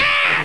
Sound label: ahh; The SoundBlaster Multi Media Experience vol 4